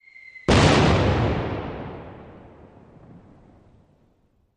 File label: 105mm Howitzer Simul